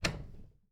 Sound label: Door Open Entertainment Center; household